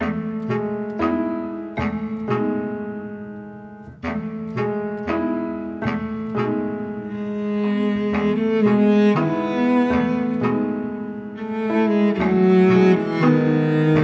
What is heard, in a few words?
Music Level Faded Loop